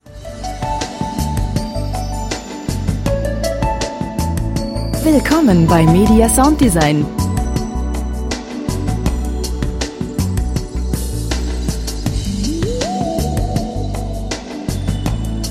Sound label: lounge